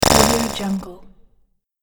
robotic circuit break

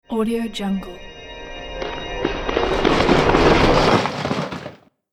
magic stone creation

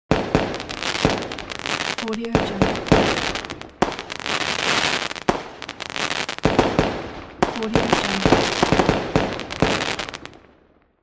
a firework

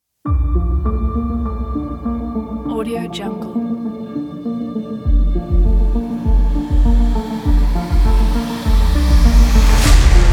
a scifi trailer

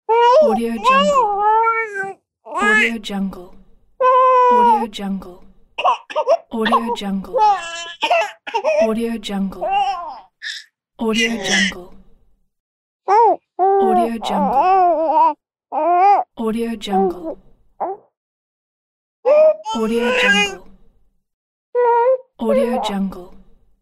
baby sounds; baby sounds vol 3

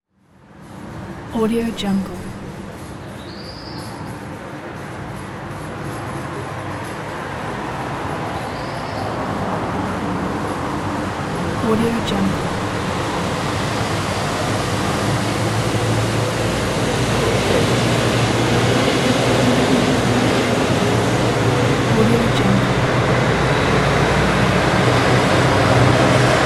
city ambience and traffic

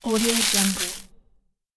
dry leave crunch

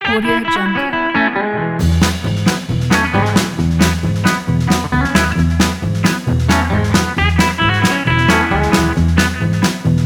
country

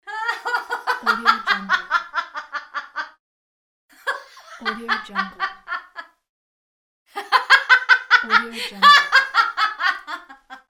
woman laughing

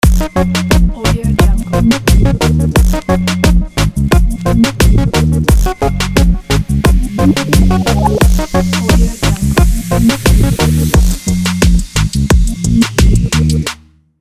reggae logo

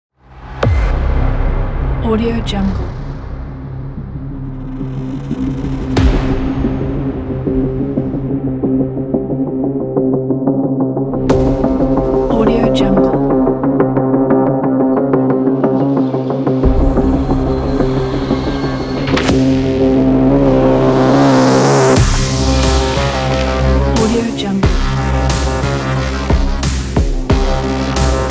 hybrid cyberpunk action

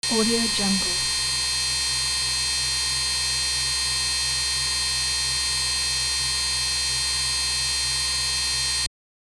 noise FM synthesis

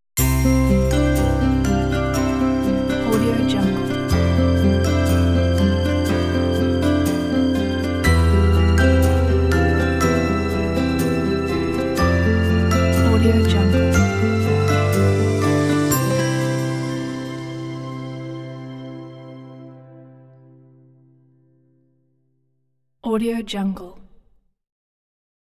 wedding acoustic ident

